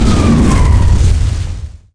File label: laser